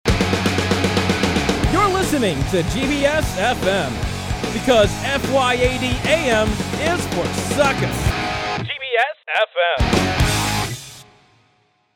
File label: jingle